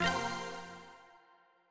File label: Jingle Shooting Range Head Shot